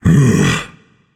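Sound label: non-verbal sigh; smite; atlas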